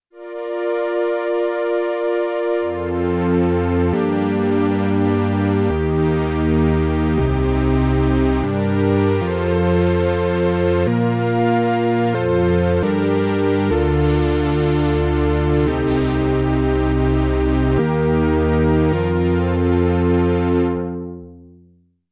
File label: Prophet 5 Strings